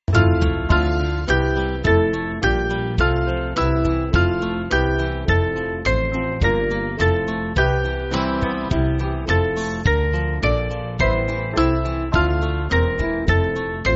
Band, church music; Shepherd Divine; St Etheldreda

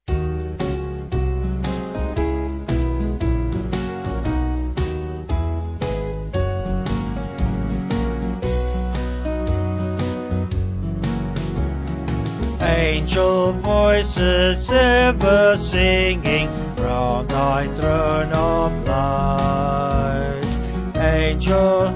Vocals Band; Angel Voices; Monk

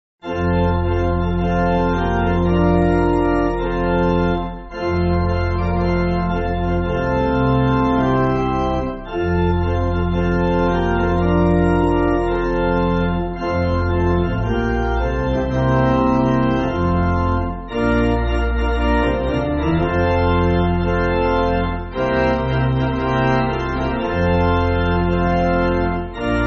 Pipe, church music

